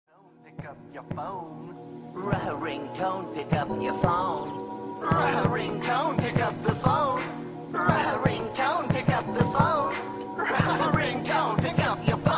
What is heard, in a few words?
ringtone rap; regular show